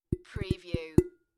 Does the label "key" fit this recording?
No